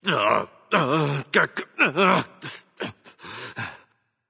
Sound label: grumble; cuchulainn